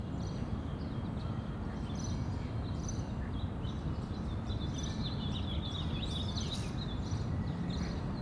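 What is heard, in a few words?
environment bird; PC Gamer IT CD 38; HG2DEMO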